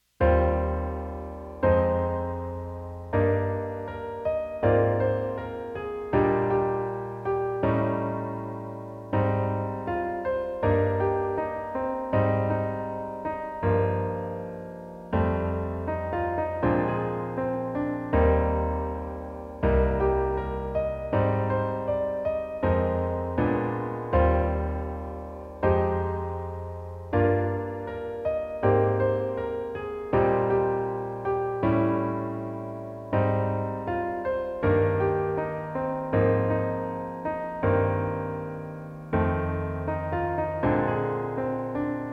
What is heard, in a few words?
Arrangement Harmonization